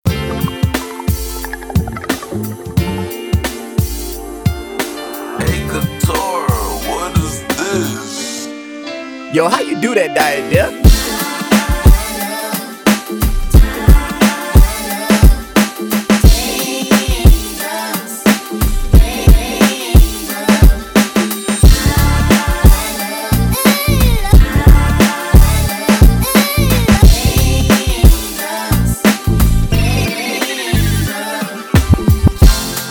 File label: Boom Bap 89 bpm Dm